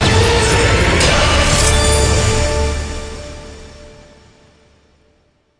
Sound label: big Win End; warlords